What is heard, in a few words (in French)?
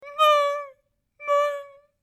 chaise métal tirée assis; Mobilier